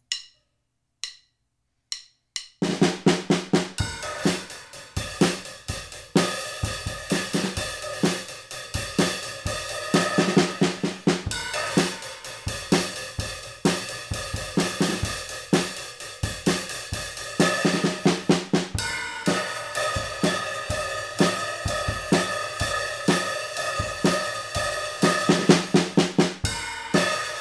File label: 2 piece 2 mic With Ride no Fx, diy mahogany drum kit